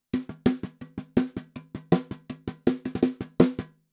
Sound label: perc intro snare